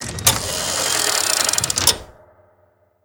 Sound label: Floor Elev Timer Switch